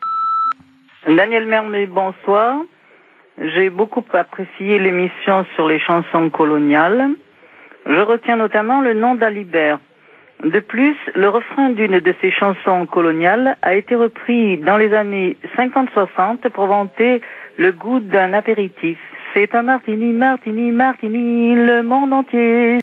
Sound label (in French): Chansons coloniales, repondeur